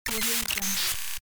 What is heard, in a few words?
hud error